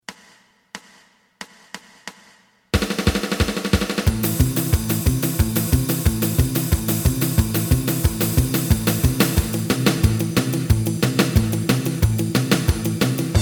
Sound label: lead